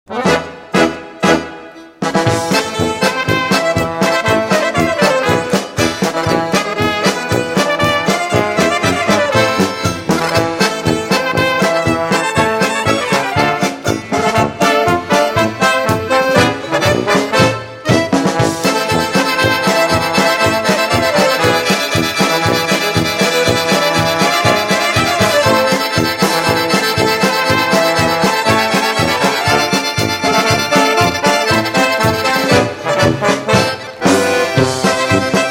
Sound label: pvc short